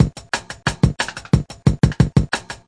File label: 179 basic tinny, drum loops and breaks; 161 to 180 bpm